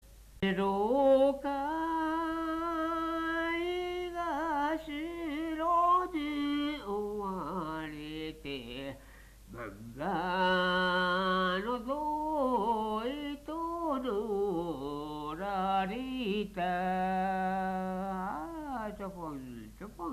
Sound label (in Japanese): taueuta, minyou